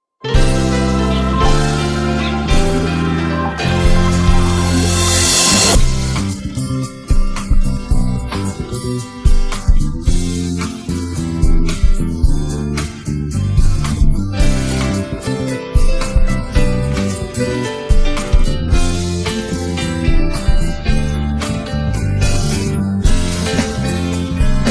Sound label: accompaniment